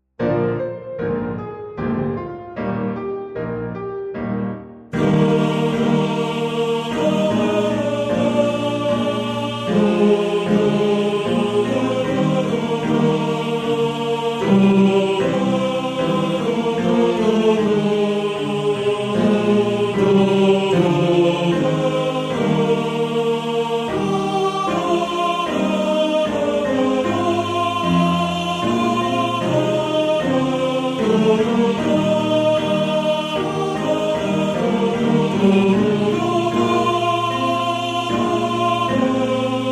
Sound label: Tenor; Santa Lucia; Tenor 1